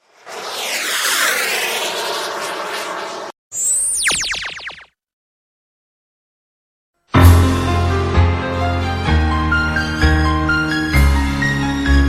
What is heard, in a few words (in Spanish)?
Música de poemas sonido de inspiración